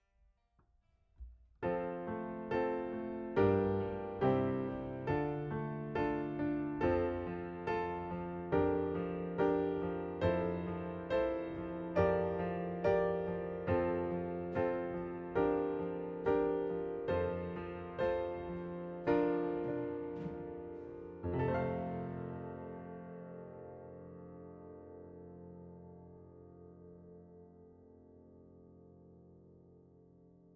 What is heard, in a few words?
Piano A